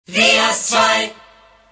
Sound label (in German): acapella